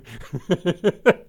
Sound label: Laughs